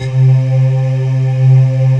PADS; Pro-Rec - Synthsonic; TR PADS

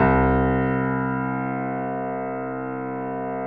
C 1, GRAND P; USB Soundscan vol.17

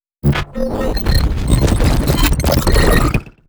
portal glitch

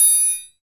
PRC SML TRI

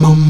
VOX MUM D#3C, Afro Chants, Stacc Mums; Roland LCDP11 Africa VOL-1

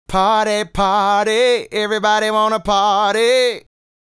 Male Solo, Hip-Hop and RnB Vocals

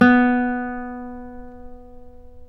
Guitar Classical, Nylon String; Roland LCDP02 Guitar and Bass

